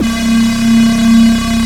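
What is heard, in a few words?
synth C2; Best Service Gigapack 1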